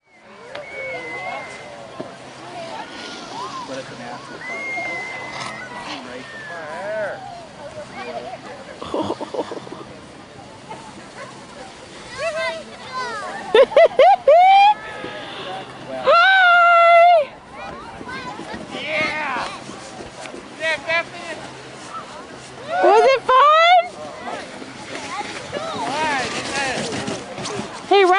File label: snow tubing